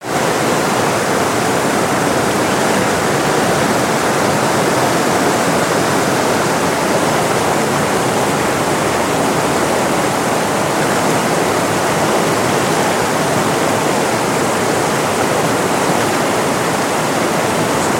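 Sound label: Large River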